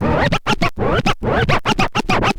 scratch short, Vinyl scratches

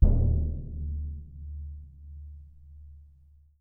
BD MP, Percussion; Miroslav Vitous - Percussion & Harp

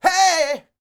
Vocal Planet R&B & Dance; ILIO

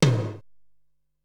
Room Tom Low, Drum Machines Ensoniq SQ-R-Plus; 300 Drum Machines

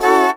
DANCE CHOR; E-MU Formula 4000 Series Vol. 2; Dance Hits

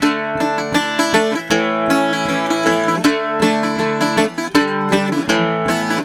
Celtic Flavours; USB Soundscan vol.42; AKAI

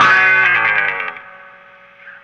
Guitar End Hit

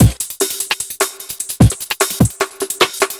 Busy Beat, Drum N Bass; Sonic Foundry (Sony Creative Software); Knutrix Drum & Bass

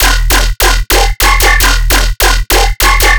bass loop 150 D#; vikings; Virtual Riot

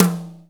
TOM, Drum Dry; East Collexion; Drum 1

